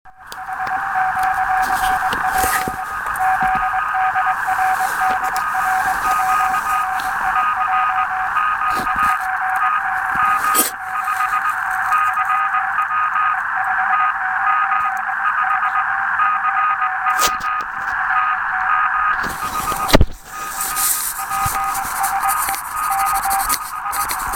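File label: QRP station 14060; QSO на самоделках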